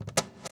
CAJON; Univers Sons - Raricussions

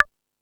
Rap Session, E-MU Pro-Cussion

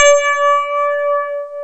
KBRD G6, ELEC.PNOS; East West; Global Sounds

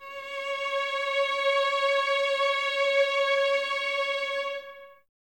C#5, 11 Violins, Soft; Miroslav Vitous

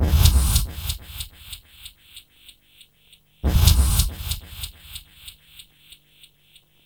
reverse swing, 70bpm; Zero-G; Freak Beats

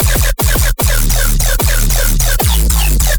bass loop real messy; Virtual Riot

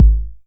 909 BD; Goldbaby Tape808 & Tape909; The Tape 909

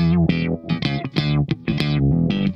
SHOT, Funk Guitar; 90 s Sample CDs; Zero G

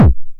909 BD Tape Sat

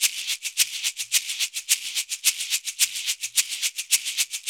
Udu Calypso

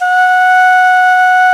VENU F#4, Winds, Old World Instruments; E-MU Producer Series Vol. 7 – Old World Instruments

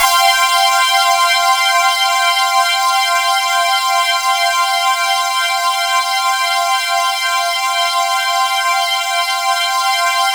C5, Synth Line; 90 s Sample CDs; NiceBeats; SynthLine VOL-27

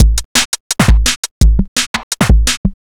Beat, Drum N Bass

